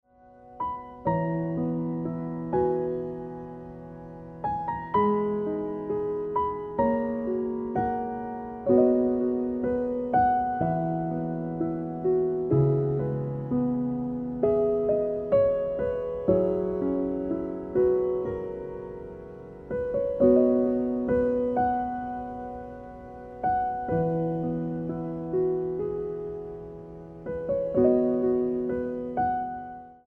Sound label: Ringtone